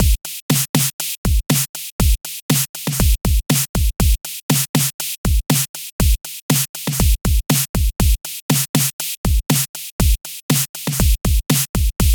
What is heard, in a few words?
basic drum roll; Rapid Art Movement; Promo Track